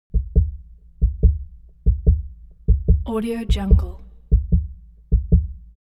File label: human body heart beat hard sequence